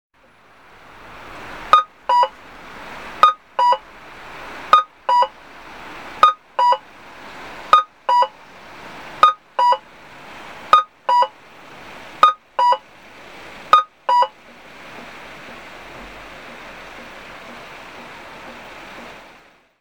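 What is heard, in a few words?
cuckoo, traffic signal; onkyo kosaten; aichi; toyohashi; karaikekita; nagoya U-TK-1; kakko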